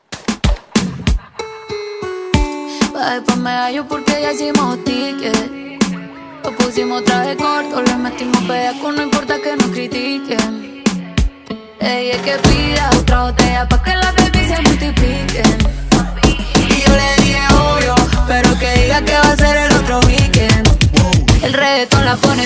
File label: Reggaeton; Redrum